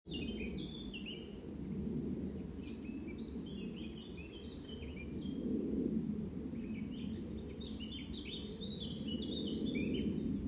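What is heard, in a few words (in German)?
Mönchgrasmücke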